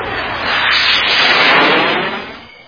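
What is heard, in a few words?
fast flying airplane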